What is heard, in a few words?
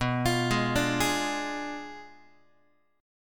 B Diminished 7th B down Guitar; Bdim7 arpeggiated; x,7,8,6,6,7